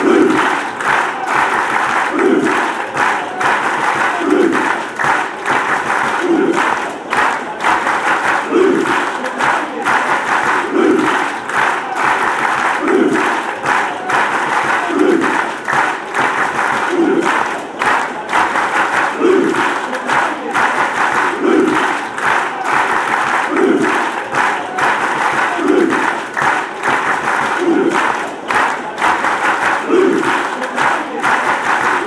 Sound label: claps blues, chants; lcfc